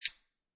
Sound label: Swiss Army Knife Close Blade, Foley, Swiss Army Knife, Closing Knife Blade; Click